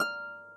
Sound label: harp